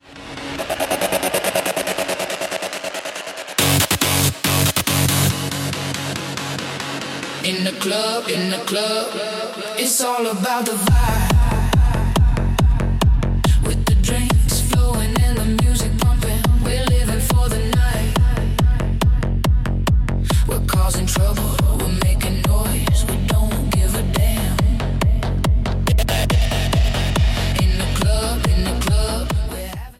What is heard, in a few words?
hardstyle remix